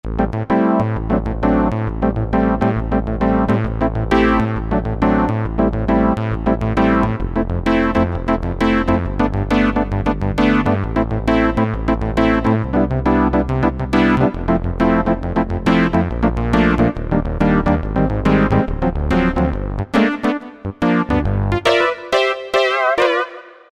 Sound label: Analogon, Yamaha PSR-3000 synth; Factory Voices; Synth & Fx